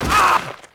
FALL, Rider

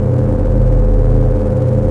gen, ambience; Star Wars Jedi Knight II Jedi Outcast; XBOX; artus

